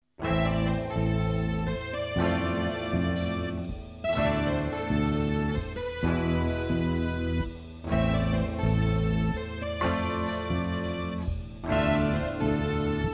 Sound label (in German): musik